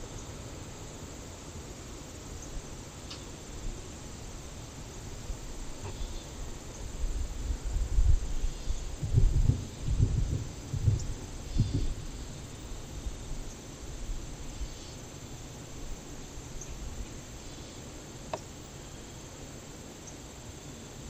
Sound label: Anomalous Environmental Sounds